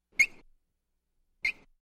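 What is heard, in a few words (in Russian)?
скрип кроссовок по полу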